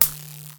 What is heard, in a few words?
Electricity